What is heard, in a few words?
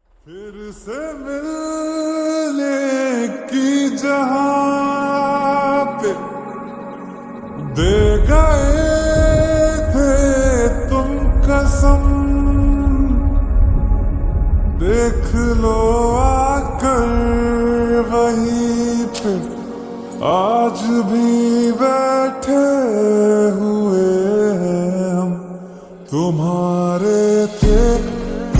Slowed Reverb Ringtone; stree 2